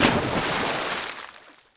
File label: splash; Splashdown; XBOX